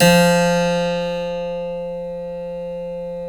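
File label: F2 clavinet left, Keyboards Acoustic; USB Soundscan vol.17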